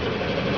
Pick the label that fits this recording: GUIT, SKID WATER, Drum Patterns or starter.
starter